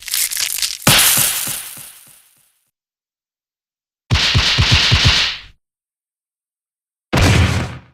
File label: breakdown; nahyuta